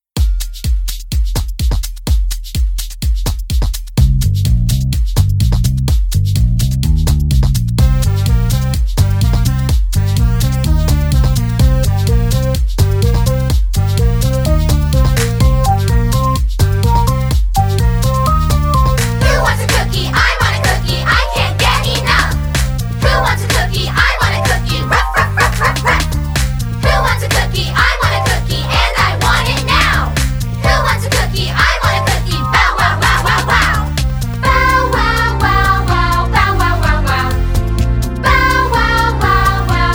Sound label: Vocals